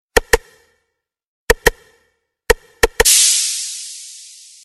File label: Knocking Message